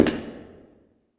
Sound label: Dungeon; kult